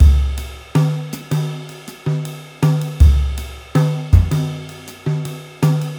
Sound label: Jazzy Set; Ударные и барабаны (Downbeat): Jazzy Set B; 80 BPM; Downtempo; Drums; даунбит